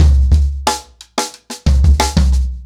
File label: 90BPM, ACOUSTIC DRUMS